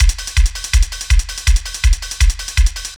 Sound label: TRANCE, Tekkno LOOPS 150; TRANCE MIDI; Masterbits - Tekkno Trax